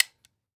Weapon Gun Shotgun 12 Gauge Benelli Automatic Safety Off; AdobeCC